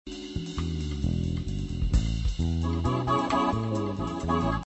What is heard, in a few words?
Harmonized Melody Amaj7